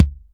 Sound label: Kick, Akai MPC500; Toolkits